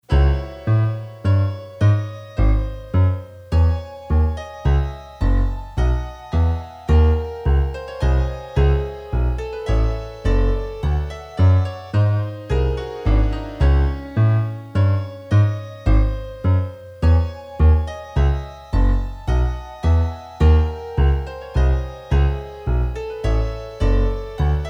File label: Menuetto, piano